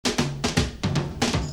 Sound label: drum intros; The drum work of the June version is simplified, but fairly synchronized with the March version